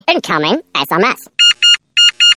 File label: Incoming SMS; dzwonki